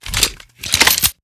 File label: smg reload; Shattered Horizon